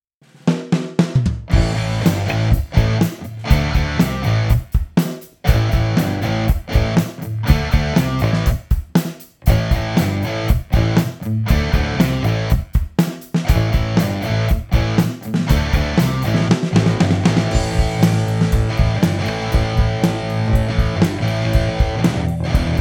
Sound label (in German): gitarren test